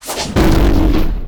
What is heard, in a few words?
super bonk